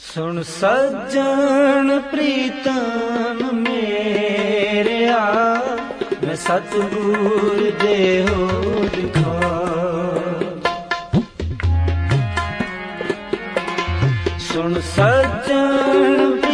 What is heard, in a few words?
Sikh Ringtone